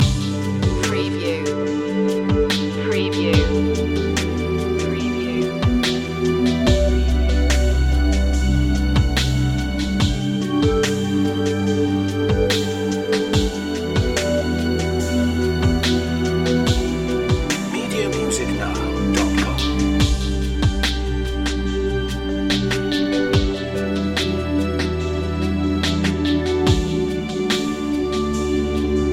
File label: square drops loop